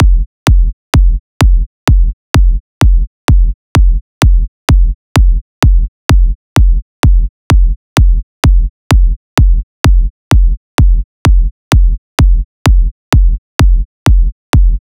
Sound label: 128 BPM Kick; Root Amin; Vengeance - Essential Clubsounds Vol.5; Demo Constr Kits; Highway Kit; Drums & Perc